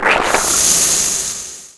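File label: ink squirt; Finding Nemo; XBOX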